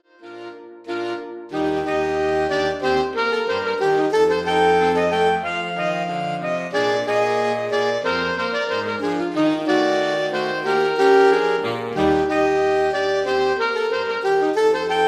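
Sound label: Tanglefoot Rag, saxophone solo; the tanglefoot rag; saxophone quartet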